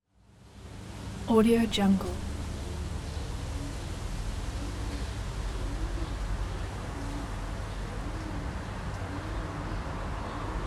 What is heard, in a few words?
city ambience with traffic; chicasound